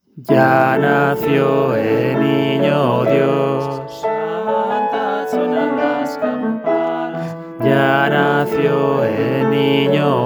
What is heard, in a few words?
Il est ne le divin enfant Manner